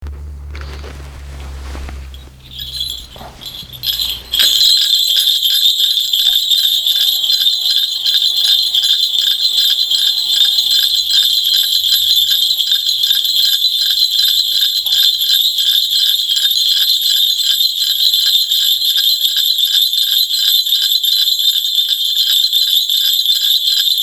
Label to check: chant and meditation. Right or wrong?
Right